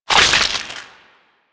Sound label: Tendrils Burst C; Warframe; Warframes